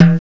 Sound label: TIMBALE; CMI Sound Library Rev 1.3